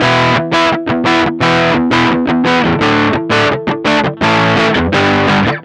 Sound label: pedals dist